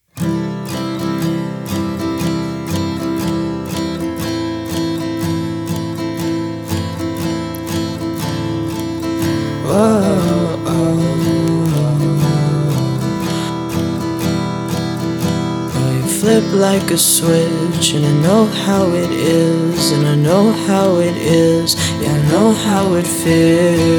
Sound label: acoustic